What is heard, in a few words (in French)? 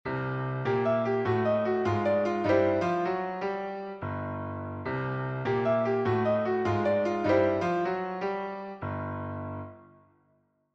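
sixtes, Riffs en sixtes, Blues Piano; Ending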